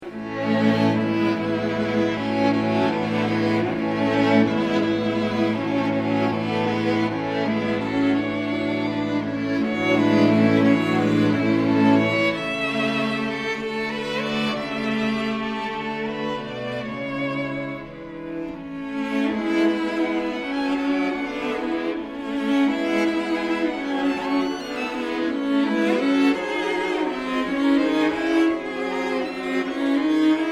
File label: quartet